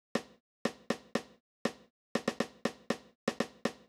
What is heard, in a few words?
Stick; hydrogen